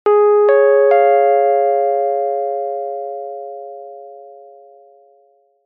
public places announcement tone bell dry

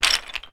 toy bricks wooden bag movement; sound effects one

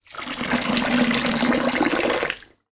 water pour fast short

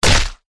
human punch smack crunch; zapsplat

